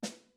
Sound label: snare pp, Struck Membranophones